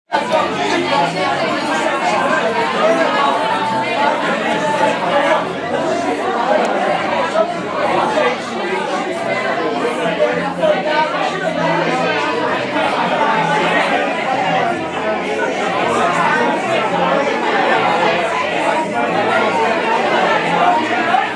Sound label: noisiest bar ever